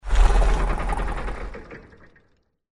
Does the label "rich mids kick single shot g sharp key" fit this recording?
No